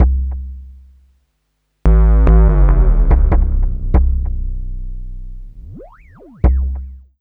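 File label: DRYAD bass drum, drum loop; Big Fish Audio; Uncivilised G'rooves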